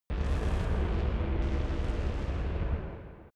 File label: space slow ship; raumschiff